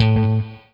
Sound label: ROCK, Guitar; 90 s Sample CDs; Quantum Leap - Guitar & Bass